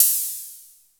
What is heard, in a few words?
Hats Electro Open